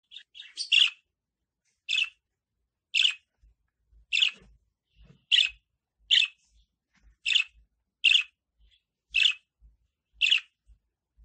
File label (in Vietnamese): Tieng chim Yen Phung hot keu